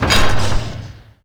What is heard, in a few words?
oil launch; Gamestar